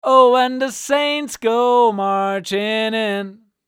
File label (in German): Gesang Sm7B HiZ Neve1073 DPA